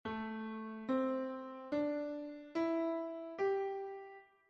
Pent menor; Asia

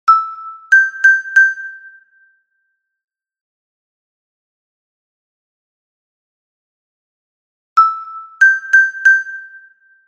Xilofono Soprano; UNHA PEZA PARA CADA FESTA

